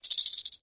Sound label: Rattlesnake, Mojave Tail Rattles. Short Rattlesnake Rattle. Close Perspective.